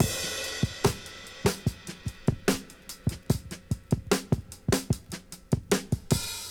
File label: breaks